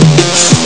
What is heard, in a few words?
solo; Amiga Soundtracker Sample Packs